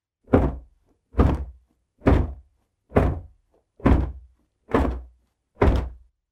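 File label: towel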